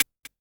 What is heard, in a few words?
muted crash, Korg Electribe ER-1